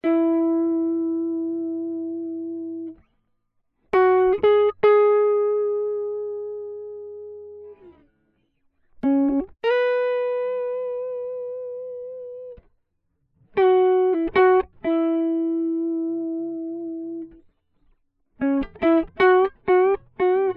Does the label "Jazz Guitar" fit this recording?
Yes